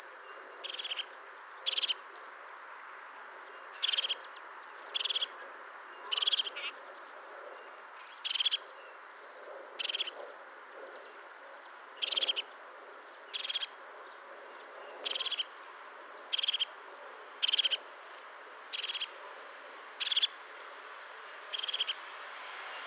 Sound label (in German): Haubenmeise Ruf